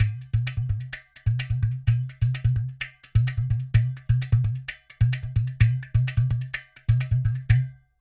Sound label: Loops 128, Percussion, Ghatam; Sample Banks